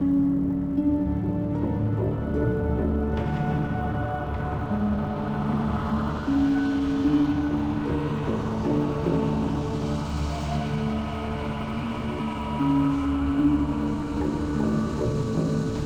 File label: Level Music; Epic of Kings